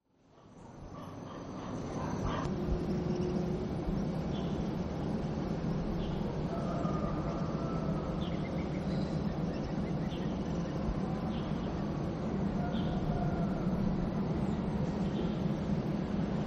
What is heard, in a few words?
birds november